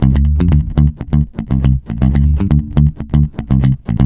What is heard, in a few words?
D, groover, bass; Spectrasonics; Bass Legends